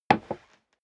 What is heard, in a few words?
step leather shoes wood creak single stair down